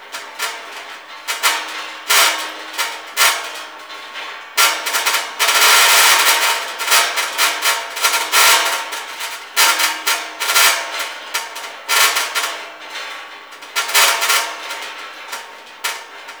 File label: Gas City Rattle Distant Metal Loop; Ambience; Props; Warframe; Corpus Gas City; Props Remaster